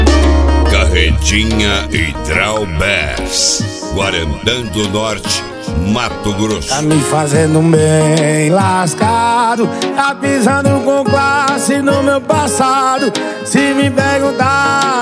piseiro

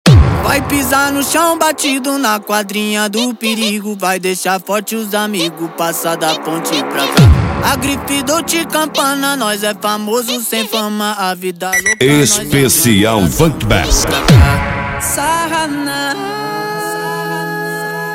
especial funk bass

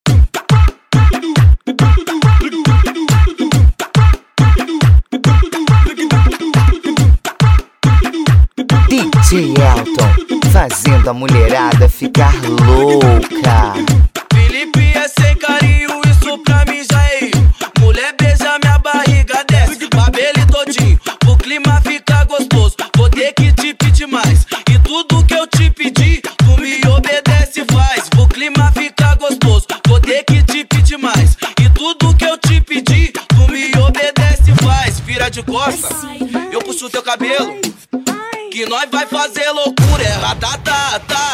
eletro car automotivo

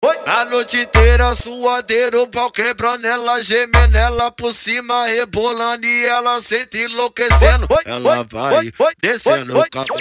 funk e lambadao

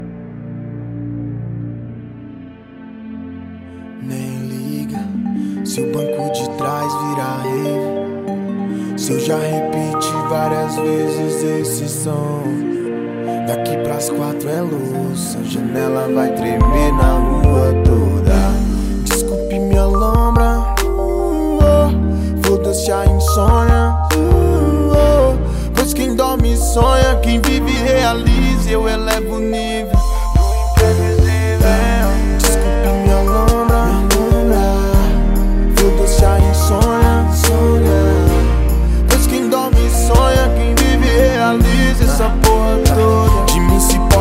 rap; cd rap flash sound

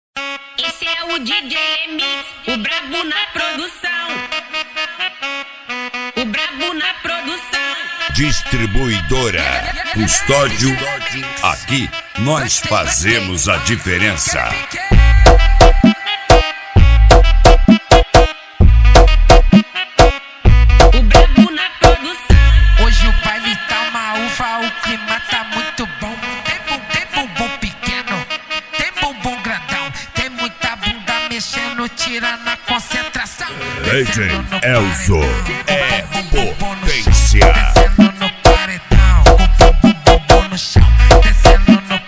mega tamborzao; reliquia; cd distribuidora custodio jaiba mg 2024

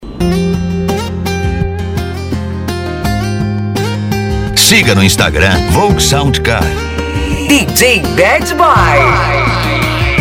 sertanejo e pisadinha; cd volks sound car

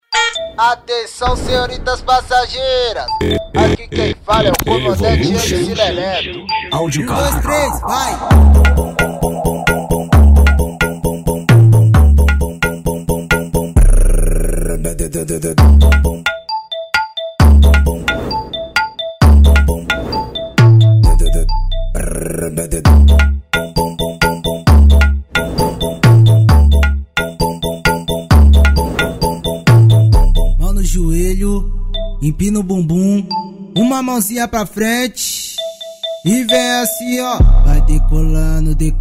funk evolution audio car

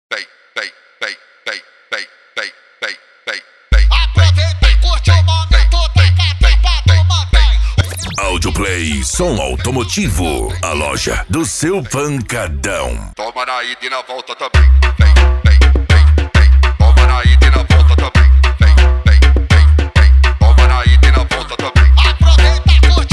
esp funk mega bass

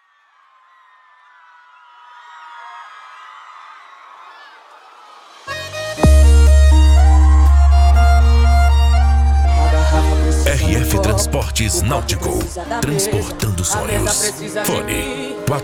especial sertanejo

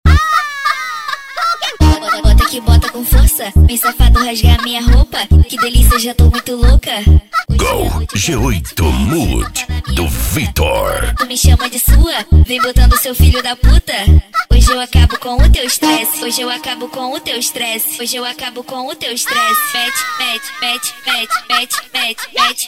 funk ritmado

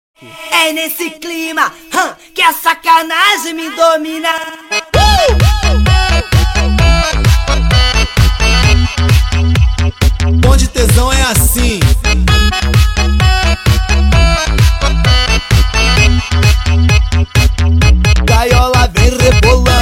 eletro funk

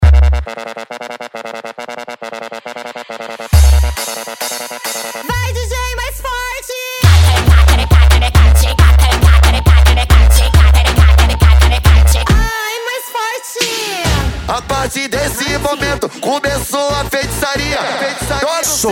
edicao automotivo; eletronica souza

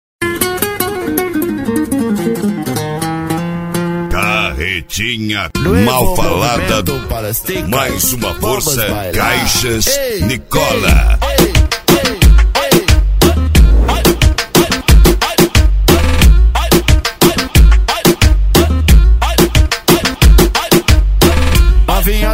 ritmo mexicano

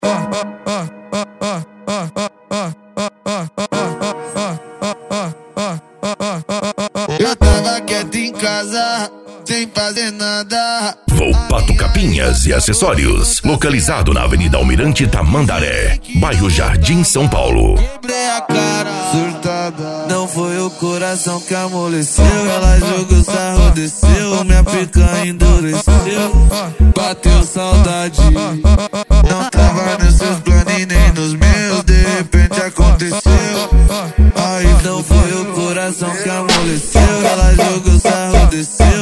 funk consciente e hip hop

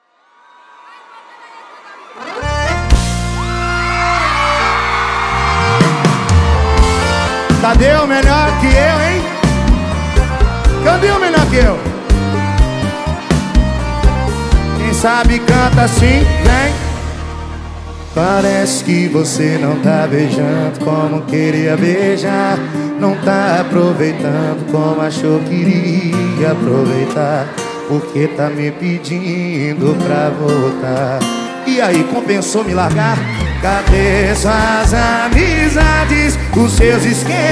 funk e sertanejo

